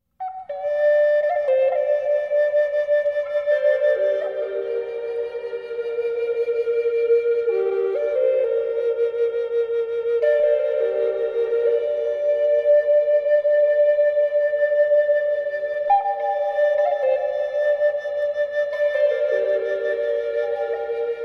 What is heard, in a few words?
Flute